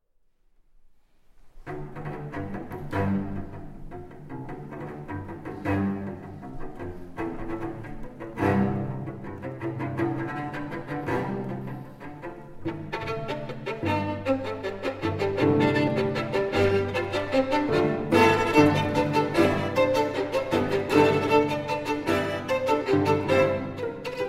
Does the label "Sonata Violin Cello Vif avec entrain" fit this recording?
Yes